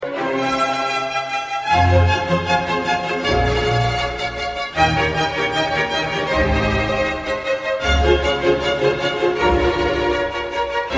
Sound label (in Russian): классика - Рингтон